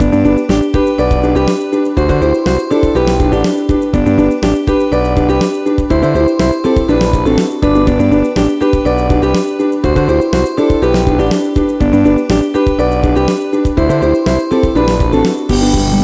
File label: chime